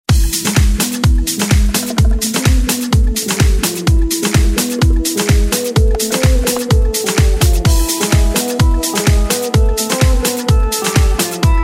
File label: flavoured mariachi; latino